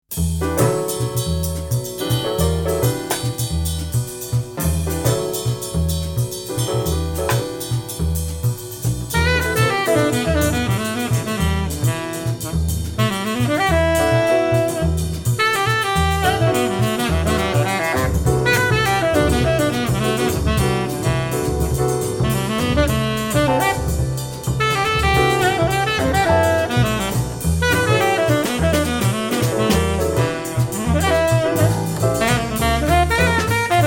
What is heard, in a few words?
jazz